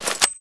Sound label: ammo pu heavy, weapons foley; Spearhead Demo; MacFormat 2003 June